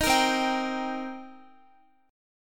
C Minor 7th C Guitar; Cm7 strummed; x,x,13,15,12,14

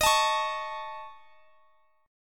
A Major Flat 5th, D sharp

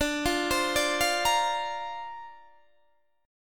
D-Minor 7th Sharp 5th D Guitar Standard, chord; Dm7#5; arpeggiated

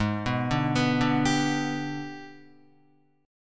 G sharp Minor Major 7th Flat 5th, Guitar; arpeggiated; JGuitar

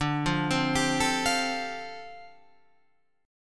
D Minor 11th D down Guitar Open F; Dm11 arpeggiated

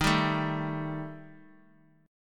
D sharp Major Flat 5th, Bass Standard; strummed; D#Mb5; x,18,17,14; JGuitar

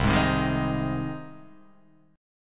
C Minor Sharp 5th C down; strummed; JGuitar

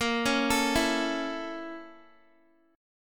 Bb Minor Major 7th Flat 5th, Guitar; BbmM7b5 arpeggiated

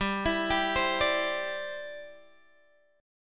C Major Add 9th G down Guitar Half Step Down

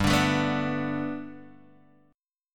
G Major Add 9th Guitar Open G, chord

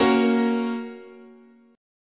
Bb Minor Sharp 5th, guitar chord

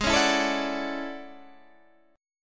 A Major 13th, guitar

